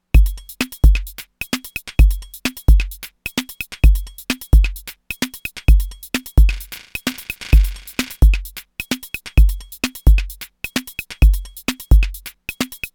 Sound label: Volca Beats